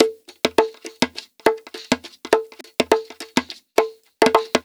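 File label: percussion, Roots Funk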